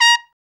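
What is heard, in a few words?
BRA TRUMP, trumpet, brass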